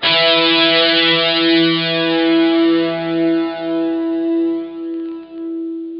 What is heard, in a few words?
GUITAR, POWER GT